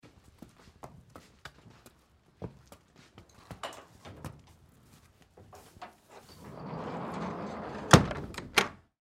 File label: Horse Walk Into Stable Slide Gate Latch Door; Animal; Horses; Blastwave